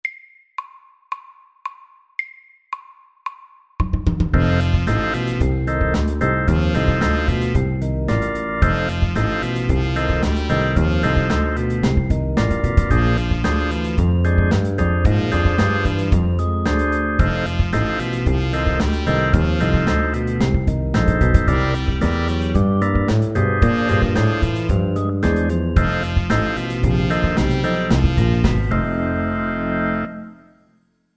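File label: 12 bar blues, medium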